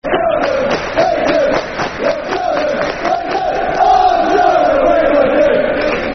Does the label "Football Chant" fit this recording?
Yes